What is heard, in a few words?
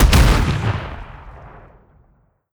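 concussion explosion body; gmod; viper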